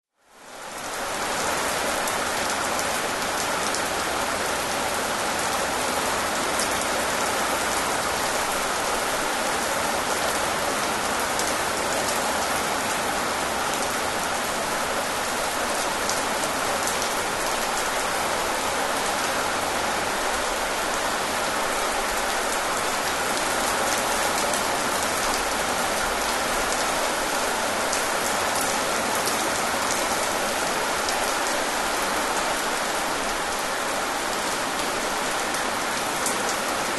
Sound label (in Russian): priiatnye zvuki dozhdia